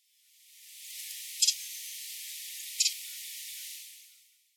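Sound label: Ruby-crowned Kinglet; sakata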